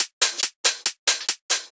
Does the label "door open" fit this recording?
No